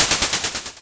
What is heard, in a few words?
Cry